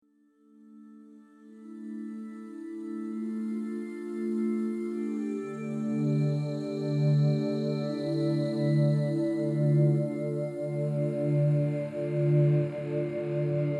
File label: Atmospheric